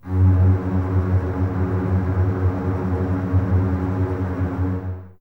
F#2, Basses Tremolo, String Ensembles; Miroslav Vitous; 90 s Sample CDs

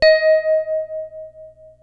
PPG D#4, synthesizer; Hollywood Edge; Giorgio Moroder Rare Synthesizer Collection; PPG.2.3

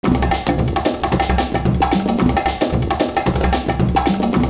CARTOON PERC; Univers Sons - Kitch 70s

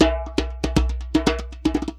120, MIXED DRUM LOOPS 120

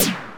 analog drums; Roland L-CDX-01

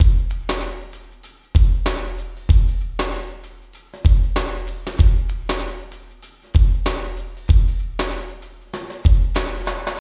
LOOPS

